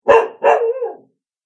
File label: Dog Bark Whimper; animal